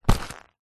leather handbag or pouch grab

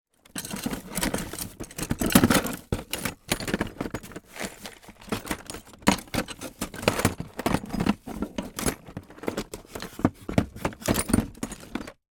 industrial toolbox full tools rummage

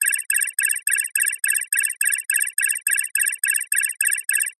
science fiction console alarm

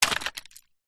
foley wood hollow small break open; zapsplat

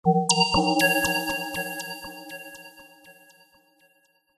sound design musical hit bells delayed

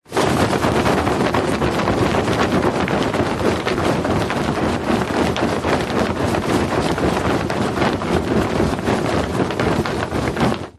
foley flag flap strong wind

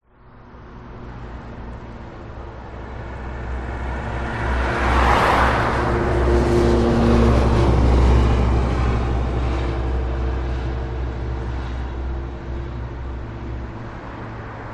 traffic pass day quiet mainly other side of road

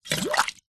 household water in metal pot bubbles blow short

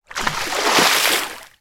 water splash or movement

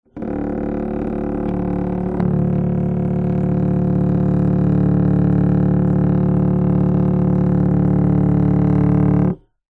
foley plastic hollow vibrate rattle

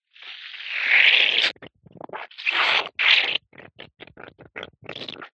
liquid frothy squelch dribbles

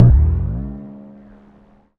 cartoon bounce med low pitch boing; dustyroom

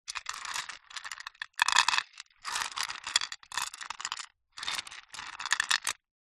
leisure chess game pieces movement in set box

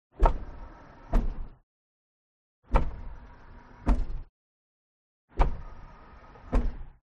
GARAGE Car Lift Down; CONSTRUCTION; smartsound

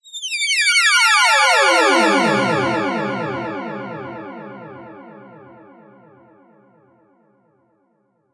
cartoon mysterious weird tone; zapsplat

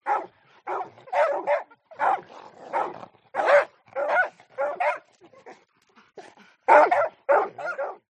animals dogs x2 bark med

